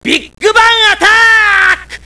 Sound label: Big bang